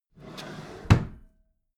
empty drawer smooth close regular

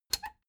plastic button latch squeak click enable fast

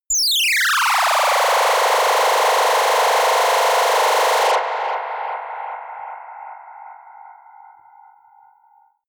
plasma gun ufo

